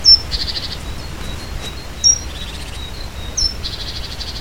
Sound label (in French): Charbonniere cri